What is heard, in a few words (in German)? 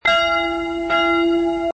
glocke as1